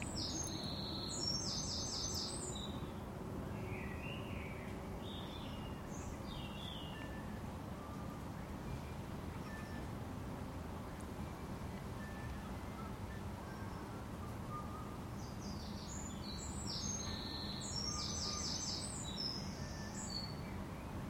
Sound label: birdsong whistling and bangles in my garden